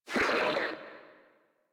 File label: Frogman Pain; Vocals; Warframe; Grineer